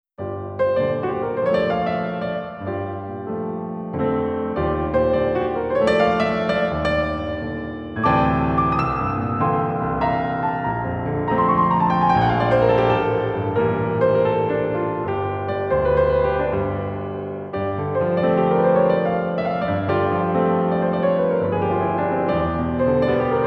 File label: Piano Ver.; Eiyuu Densetsu Evolution - Ao no Kiseki